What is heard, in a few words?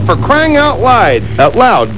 cryng out